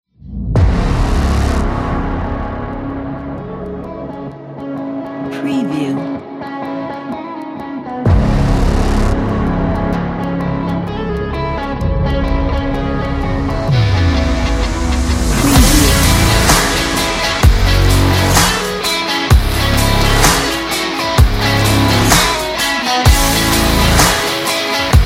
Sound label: epic rock